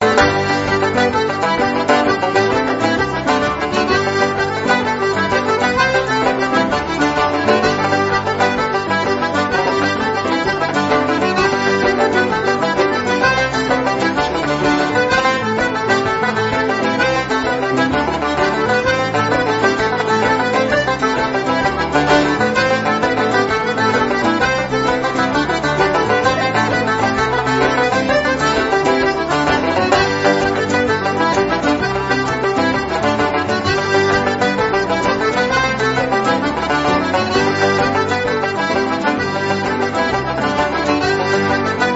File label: Jig; Jim Ward-s Jig